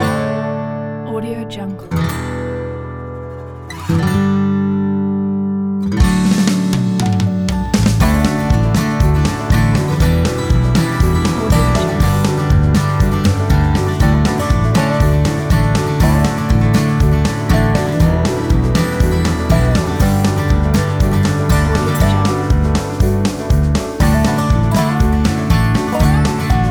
country story

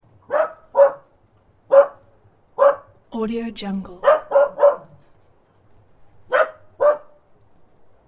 hound dog barking

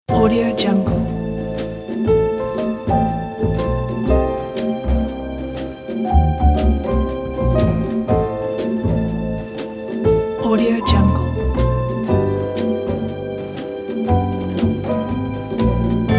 50s noir jazz

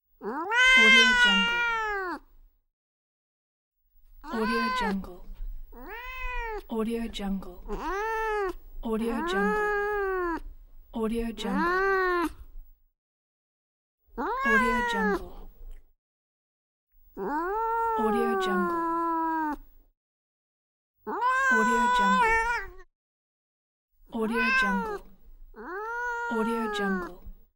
cat meowing and crying sounds

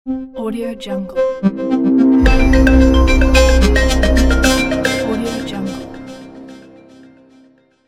hitech minimal logo; nikitaka

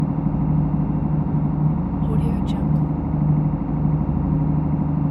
small boat diesel engine bridge loop

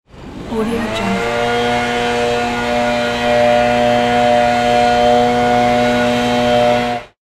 air blowing; soundfxpro

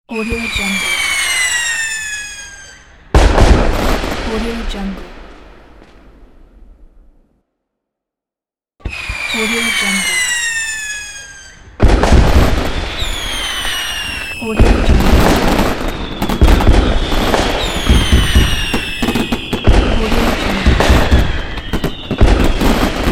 fireworks

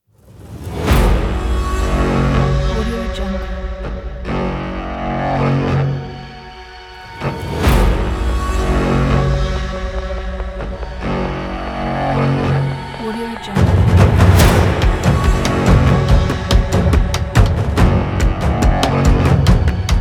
vikings attack trailer

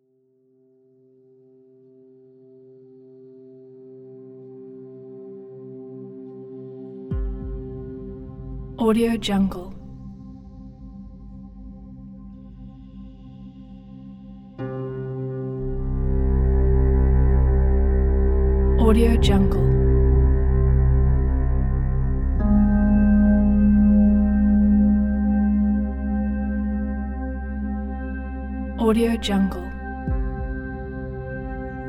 sacred forest

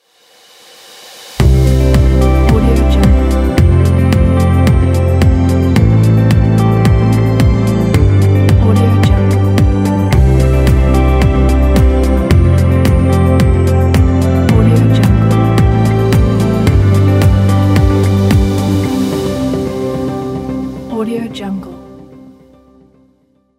corporate ambient logo

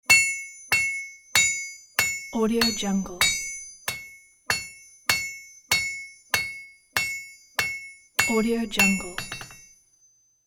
hammering metal sound; soundall

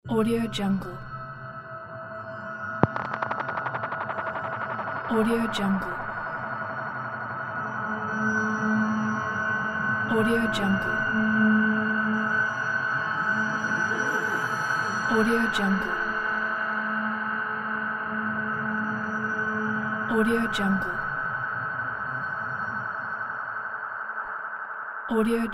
cosmic sound

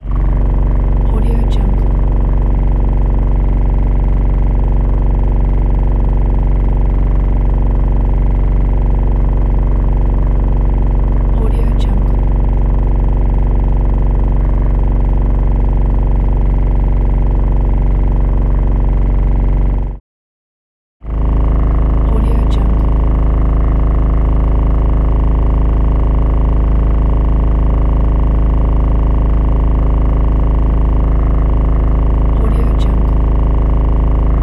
game car engine; soundsground

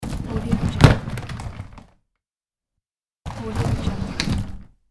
folding accordion sliding door open and close

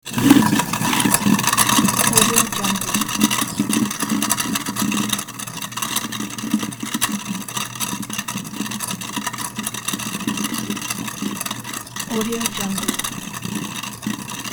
garbage disposal wet and hollow

